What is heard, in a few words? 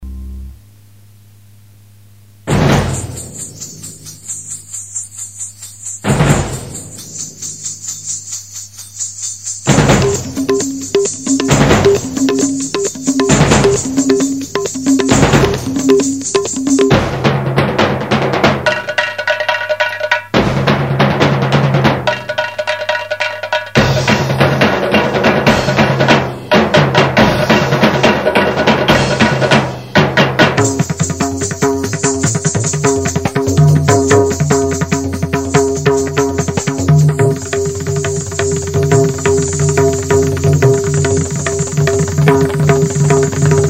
instrumental; Odia